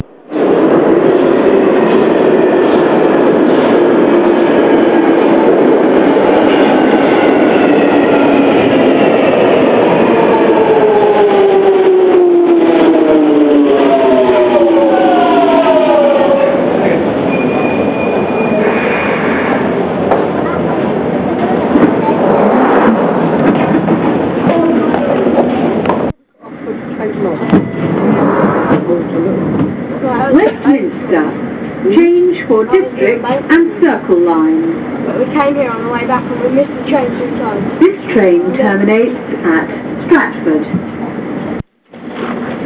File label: the tube